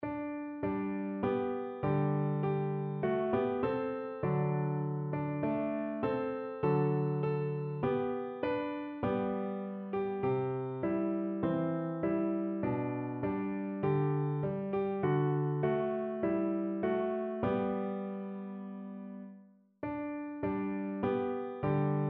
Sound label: Easy Piano; Childrens Song